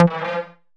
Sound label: Synth Shot